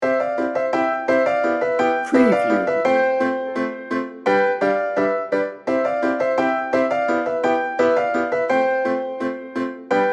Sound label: dancing in light loop